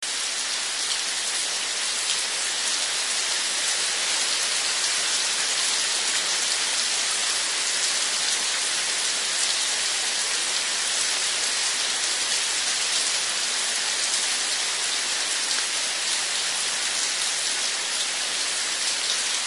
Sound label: First Rain